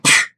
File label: Punch Sound